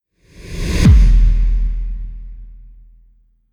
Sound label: hissing whoosh to deep hit film trailer cinematic